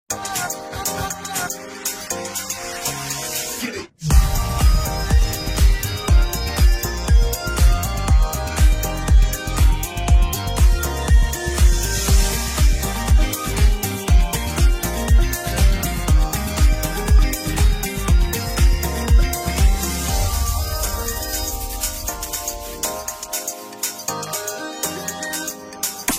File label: Mi Electronic, ringtones; Xiaomi